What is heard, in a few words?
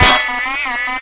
sentry damage, weapons; tf